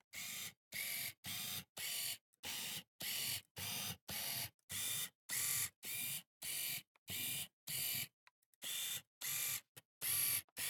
Camera, Pentax KS2, DSLR, electronic, mechanical, focus, adjustments, jitter, various, XY; Eiravaein Works; Vaeyan IV